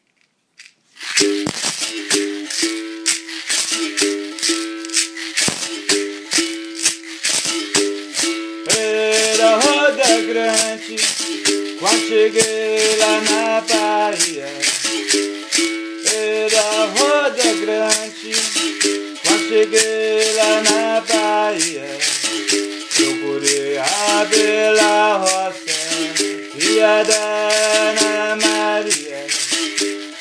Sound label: berimbau; Era Roda Grande